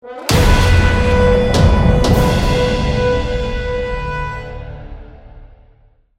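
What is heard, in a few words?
Music Stinger Snow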